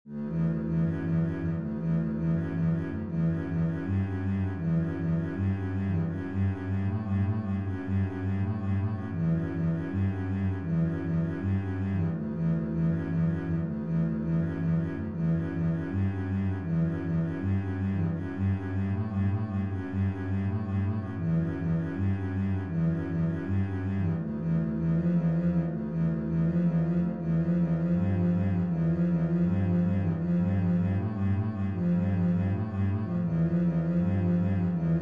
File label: bass etude